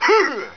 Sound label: UGGH; Pirates! Gold